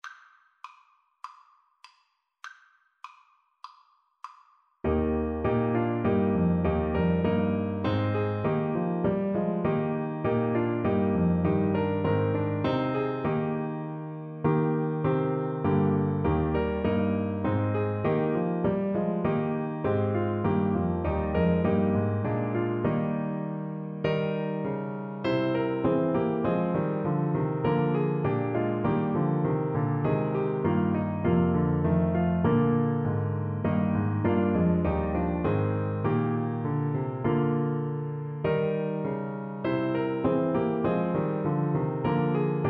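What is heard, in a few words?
angels, alto saxophone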